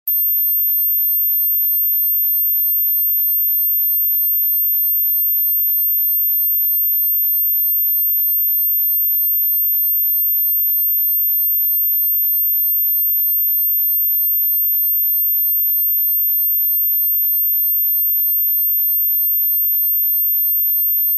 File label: Anti Dog Sound